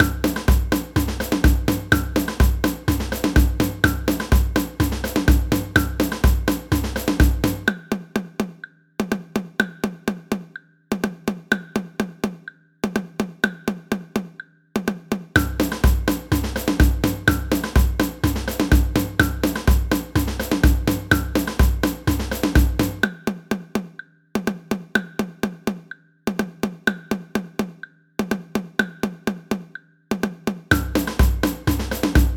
tamborim comp, samba

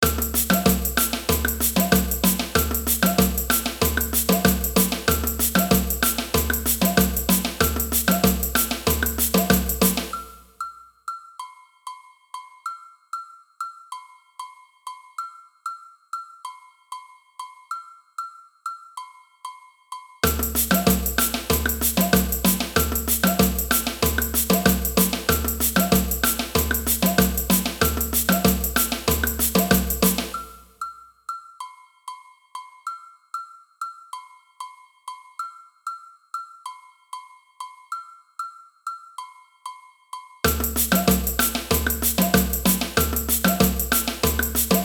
agogo, samba; samba band

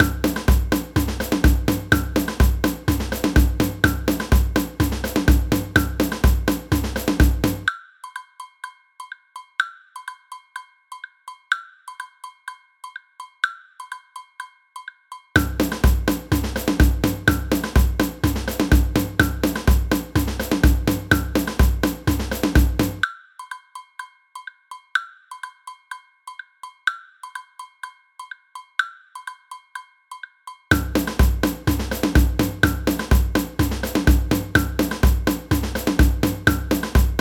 agogo, samba

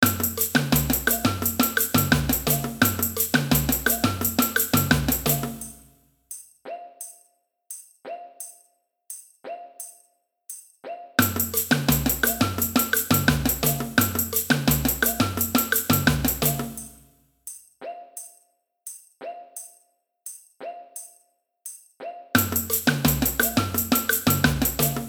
cuica, samba; samba band

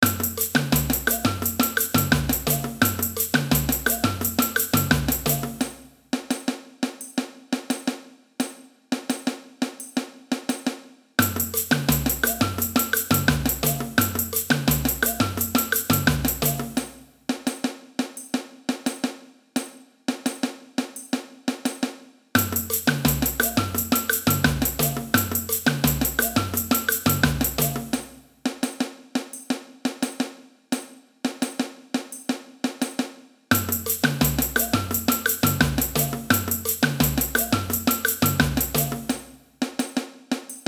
rep, samba; samba band

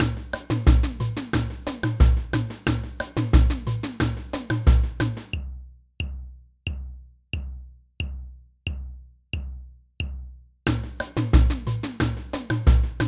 surdo, samba; samba band

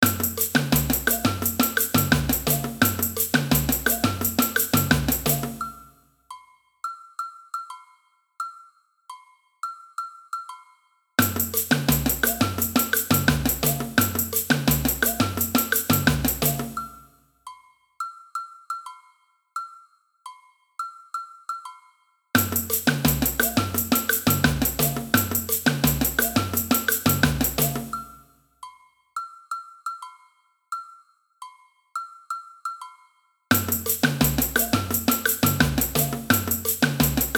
agogo, samba; samba band

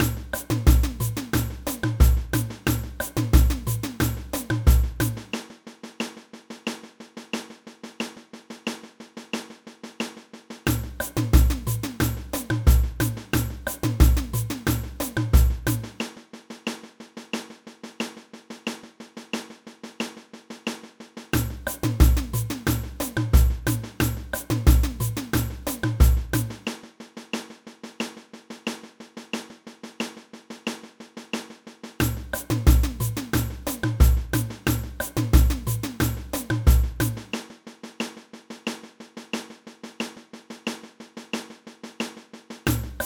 caixa, samba; samba band

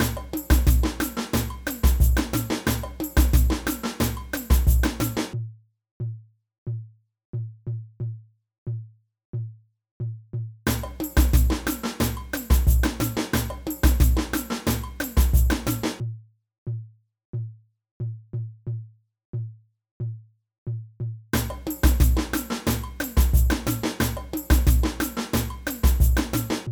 surdo, samba; samba band

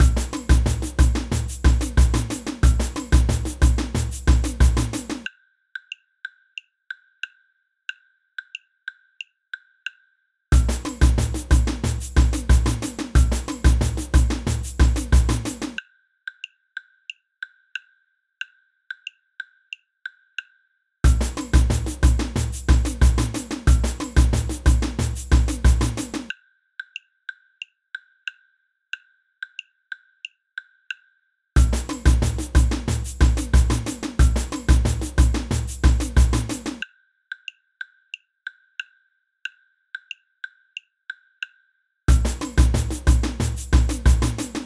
block, samba; samba band

